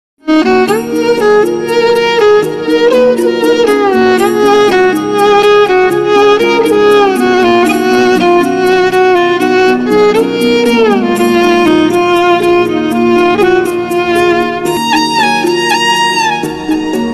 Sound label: instrumental ringtone love ringtone; mahakaali; shiv kaali